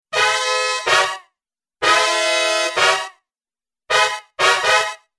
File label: Big Band Brass Hit